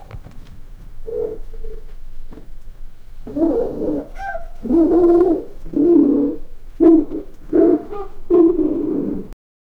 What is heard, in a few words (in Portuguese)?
elefante fazendo coc, elephant